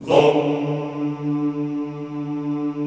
Classical Choir Hmmm D2; Best Service; Extended Classical Choir

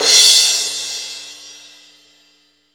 CRASH, OLD ZIL CRS

